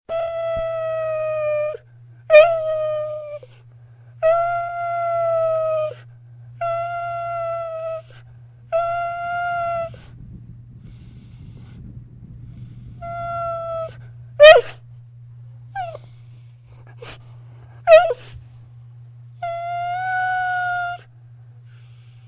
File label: crying